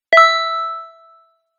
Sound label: text receive